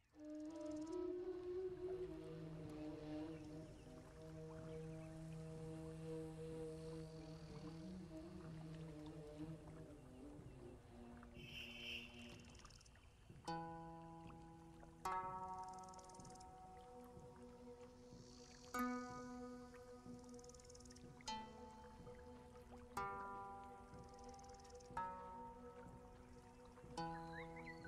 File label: Ancient Egypt Ambience NILE RIVER AMBIENT Egypt Music; River sounds; Soundtrack